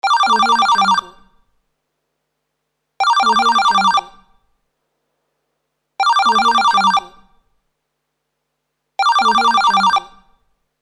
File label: ringing telephone